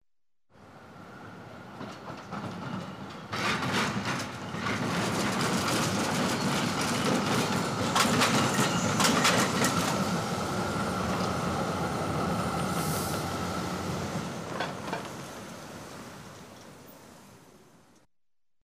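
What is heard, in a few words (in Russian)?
трамвай старый проезжает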